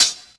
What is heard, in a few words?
raw high hat B key, Tuned drums